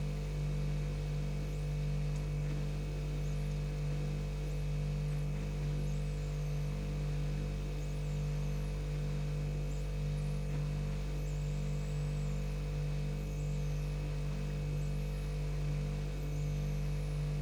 light fridge

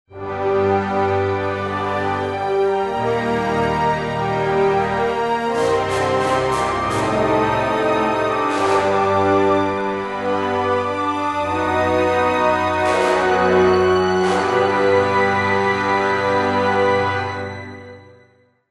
Win Battle; Heroes of Might and Magic Online; Fortress

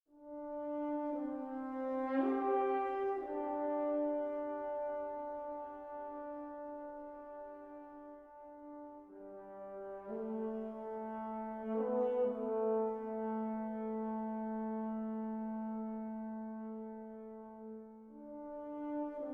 Stinger Gameover Positive Stem Solo Horn

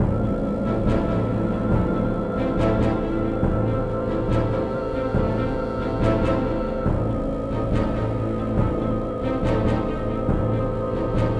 Stealth Layer Suspicious; Abudin; tintin reporter cigars of the pharaoh

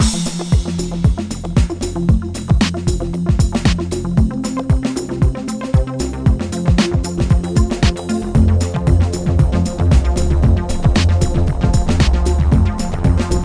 Dance

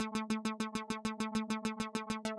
SYNTH SEQ; Vostok Inc.

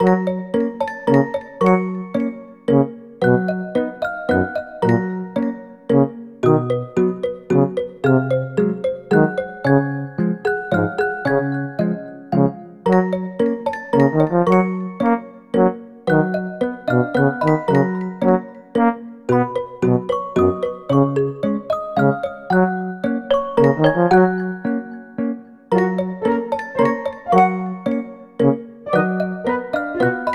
Elevator Bottom; peppa pig world adventures

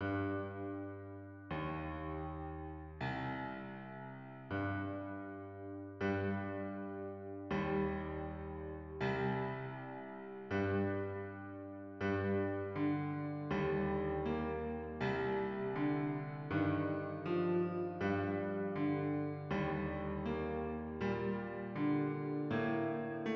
cutscene music - slow piano; cutscene music 5 - slow piano title

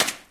Body Smack; friday the 13th killer puzzle

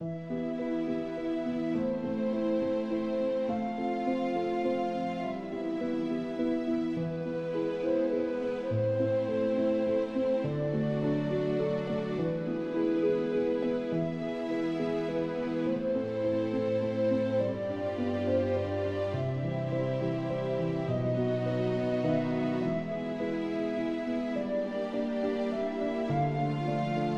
Forest Exploration Loop; Erius Forest; Curse of the Sea Rats

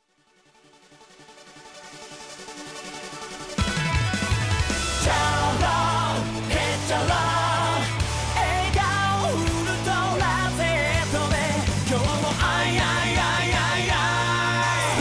Backing Track

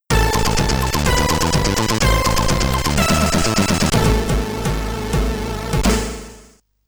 Exit Open; Commodore 64

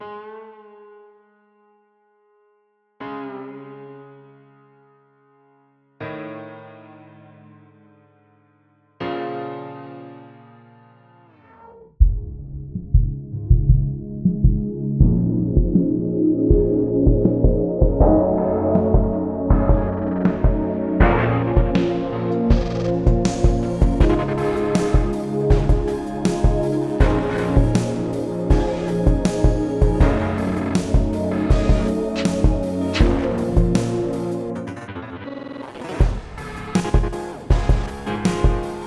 Instrumental; Friday Night Funkin Hit Single

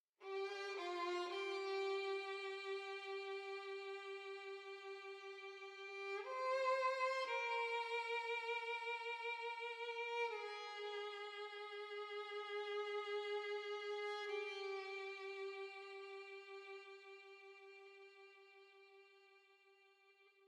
Stinger Gameover Negative Stems Solo violin

